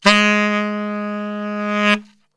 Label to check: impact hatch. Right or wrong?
Wrong